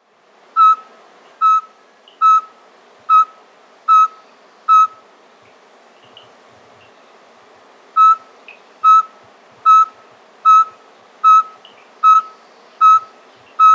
Yungas Pygmy-Owl (Glaucidium Bolivianum), Andean Birds; S. America